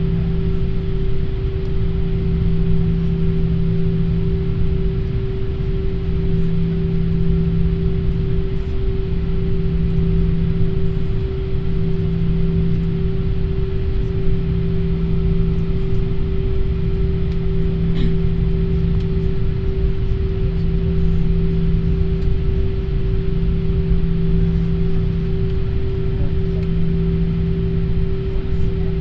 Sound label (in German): flug; Brasilien; Fieldrecordings